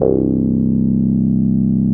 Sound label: ODY bassics C2, vintage keyboards; Big Fish Audio - First Call Vintage Keyboards